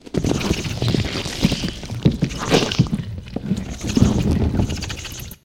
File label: Swarm Inside Wall; silent hill homecoming; ps3; x360